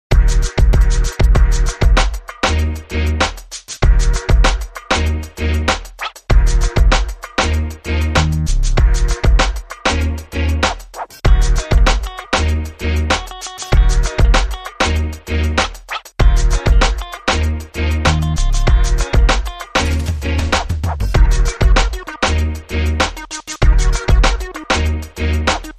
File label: Uptempo R&B